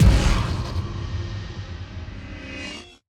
Shocking; LOOPERS

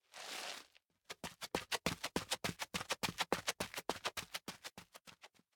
Look Back; LOOPERS

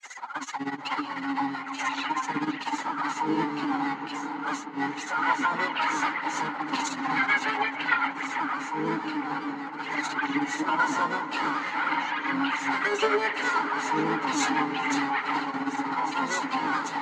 Horror; LOOPERS

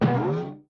Impact; LOOPERS

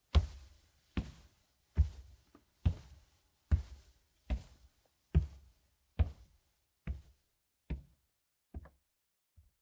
Footsteps Go Down Stairs; LOOPERS

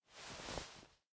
Rustling Of Clothes; LOOPERS

